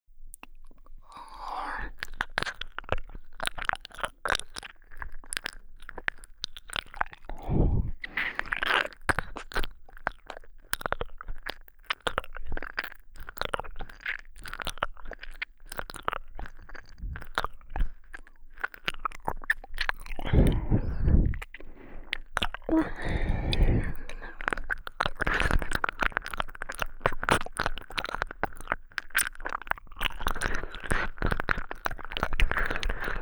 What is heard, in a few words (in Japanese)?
耳奥舐め＆耳奥舐め回しループトラック, ASMR